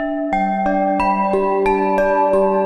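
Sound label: Hopeful Bowls, G Major 90 bpm; MIDI Clips; Tonal; Motifs; Ableton; Factory Packs; Mood Reel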